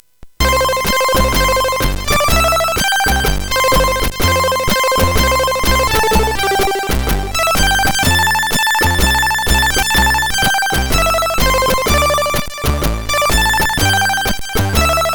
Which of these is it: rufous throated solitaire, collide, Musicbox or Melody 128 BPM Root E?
Musicbox